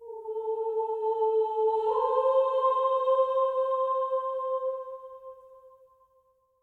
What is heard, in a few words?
choir seq